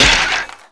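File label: DMG skeleton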